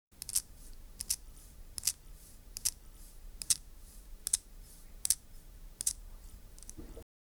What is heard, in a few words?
sound of grinding spices